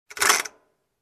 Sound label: Clock gear roll